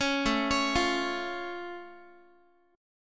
A Augmented A down Guitar; A+ arpeggiated; 16,17,16,15,x,x

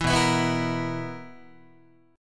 B Major 7th Suspended 4th B, Guitar; BM7sus4; strummed; 7,7,7,9,8,9

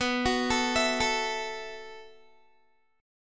B 7th Suspended 4th B, Guitar; B7sus4; arpeggiated

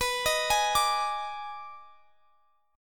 Eb Major 7th Suspended 4th Sharp 5th B

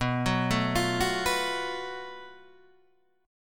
Gb Minor Major 7th Double Flat 5th B

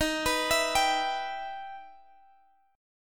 E Minor Eb, chord; Em/Eb; arpeggiated

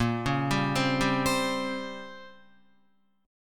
Bb Minor 6th Add 9th Bb down Guitar; arpeggiated; JGuitar

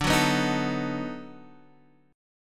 B 7th D; B7/D strummed; JGuitar

